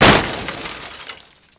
CRASH, 碰撞声音素材

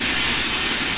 HUM, 机器

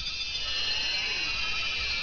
BELLS

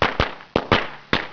cracker, 爆炸; 爆炸系列声音素材

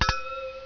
CRYSTAL; 铃声素材

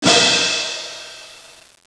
ORCH CYM

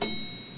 BELL

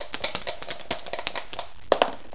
DICE THRW, 碰撞声音素材

